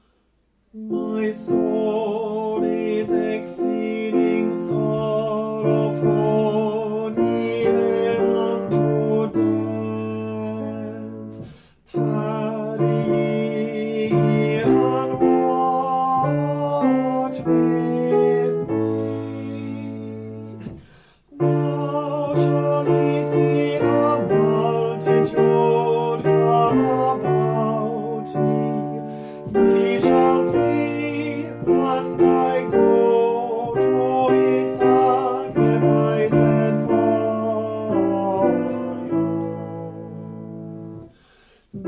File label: tenor